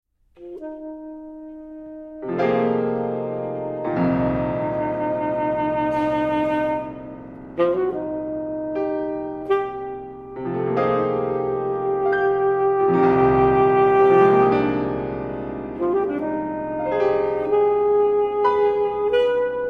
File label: Sax